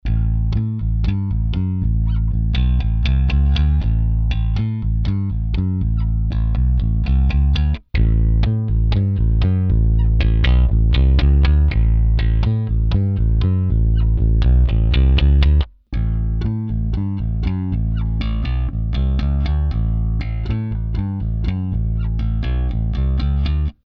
Rickenbacker Clean Studio

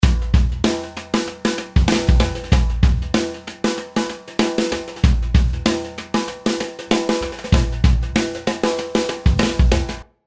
Drums Tiny Drum Plate

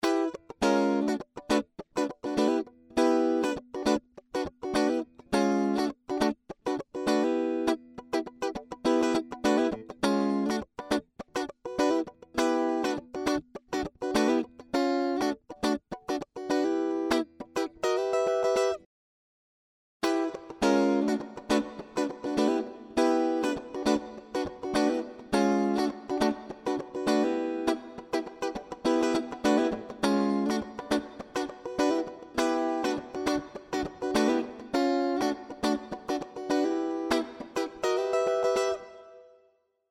Vergleich Plate V1 Small Plate

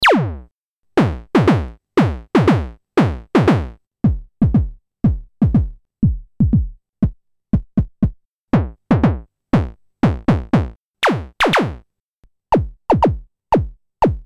Synthesizers A1 Kicks Dry

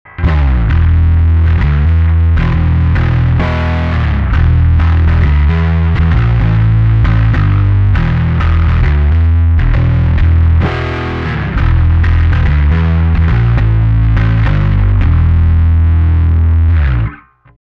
Alpha mix higain growl bass treble boost

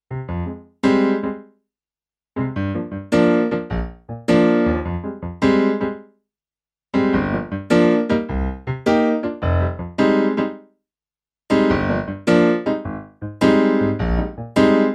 Grand Piano XXL Vienna Piano

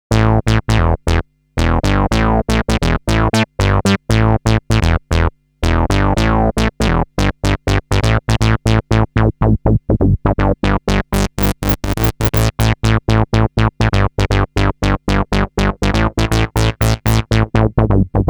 sound bass

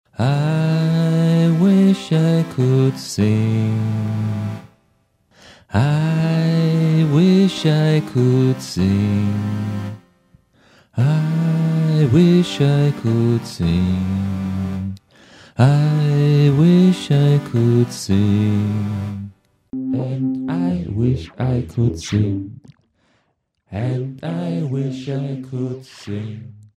voc mouth

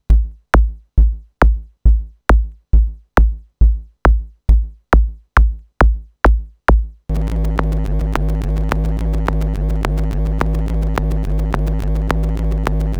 Daiko Loops; ALM Tyso

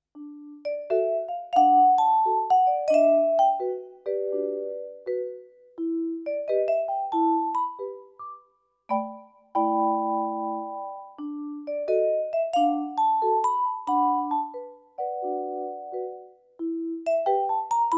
Vibes

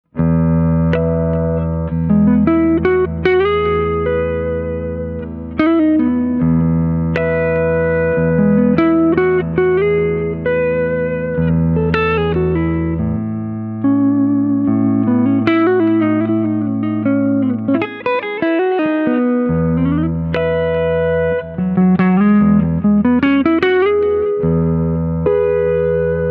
Clean Front Humbucker; Clean Theme

